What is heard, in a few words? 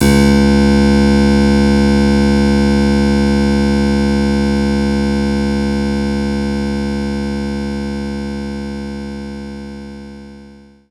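Harpsichord C sharp 2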